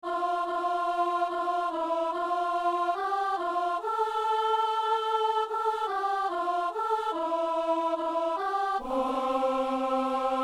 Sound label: alto; Psaume 32 (33); Messe du 17 janvier à Saint-Denis